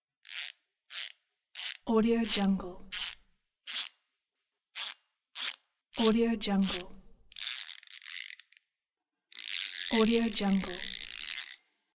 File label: winding mechanism